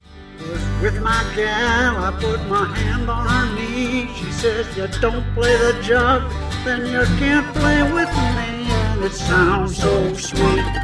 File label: Jug Band Music